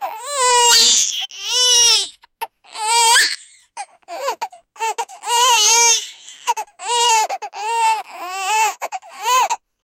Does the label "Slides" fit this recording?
No